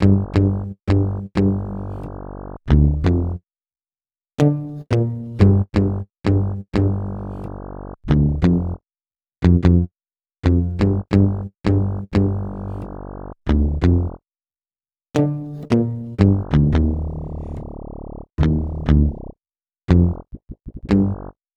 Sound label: Bass, Hip-Hop